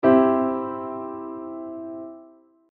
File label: major chord; major triad